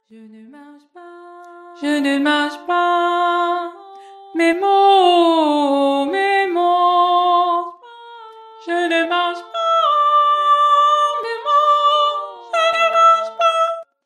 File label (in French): VOIX